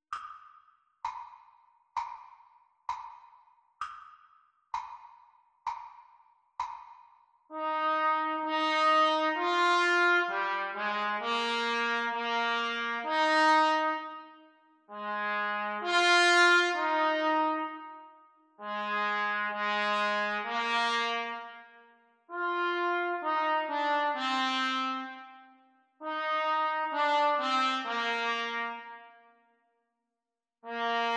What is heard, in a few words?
Trumpet Duets Sea Song Practice Speed; Grade 3; Practise Speed; Cornet Duets